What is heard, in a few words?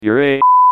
pain severe; pyro; johnmadden